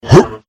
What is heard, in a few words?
Jump Sound (Hup); DoughnutQuest